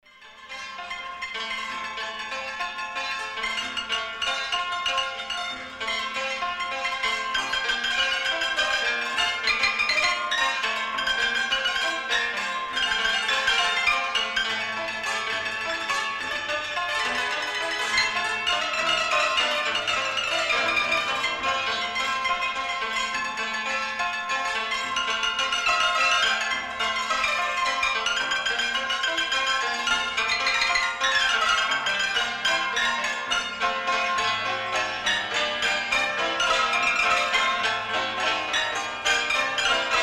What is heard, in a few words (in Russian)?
SHarmanka